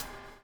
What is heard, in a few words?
BUBBLE; Spectrasonics - Backbeat